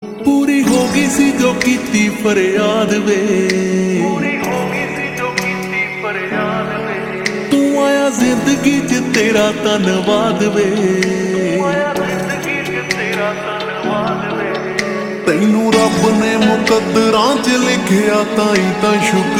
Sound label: Ringtone Slowed Reverb